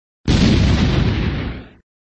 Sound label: Sound SFX, flipper